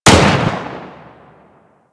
Pistol Shot 3D; Kohm

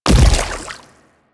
Splitter Land Water, Footsteps; Warframe; Infested; Grey Strain

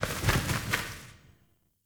Sparrow Death State Four Wing Flaps; Animals

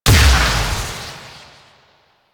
Fire Medium; Weapons; Warframe; Duviri; Incarnon Adapters

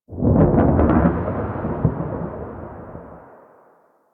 Thunder One Off; Warframe; Sacrifice; Vitruvian Memory

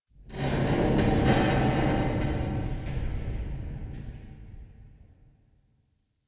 Stalker Cave Metal Tension; Ambience; Warframe

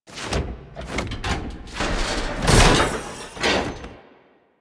Nose Finisher Whoosh Jump Slam; Warframe; Venus; Orb Heist

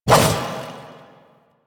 Two Handed Nikana Swing; Warframe; Duviri; Duviri Dax; Weapons

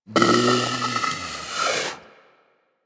Predator Snore; Warframe; Hunting Critters

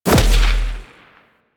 Edge Kick; Warframe; Warframes; Pacifist

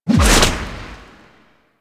melee heavy attack whip whoosh; Warframe; Weapons; Whooshes; Heavy Attacks

